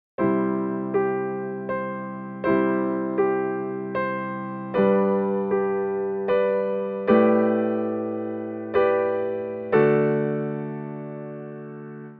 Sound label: Whole step modulation